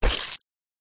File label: virus hit; gardenbloom